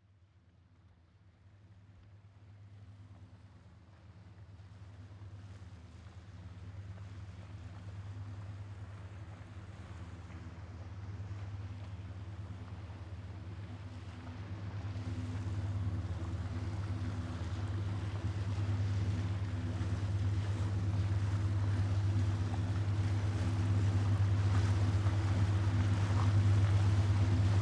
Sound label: Boat Pass By Slow, Canal, Large; Transportation, Watercraft; BlastWave